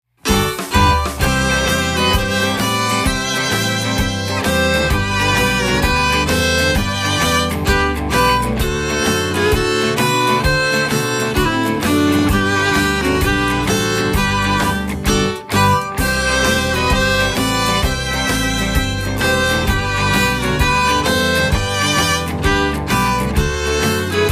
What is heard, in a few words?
TEXAS WESTERN SWING